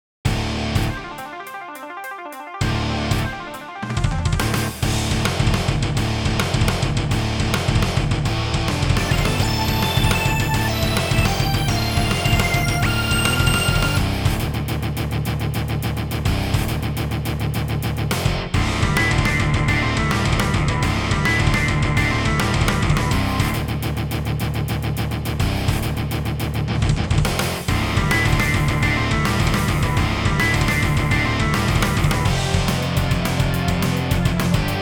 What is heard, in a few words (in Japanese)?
ロック